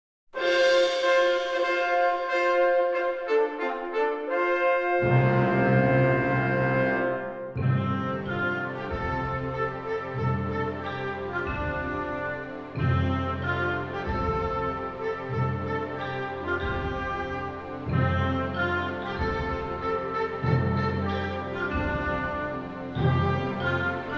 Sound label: central african, national anthem